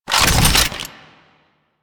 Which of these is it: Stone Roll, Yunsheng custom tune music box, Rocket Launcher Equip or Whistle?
Rocket Launcher Equip